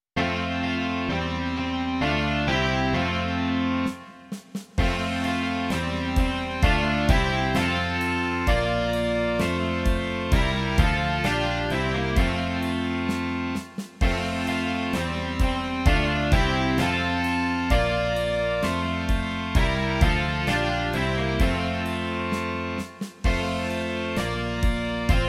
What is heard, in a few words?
rock